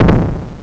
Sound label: gun; MISSILE